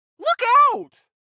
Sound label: car reaction; Blind Drive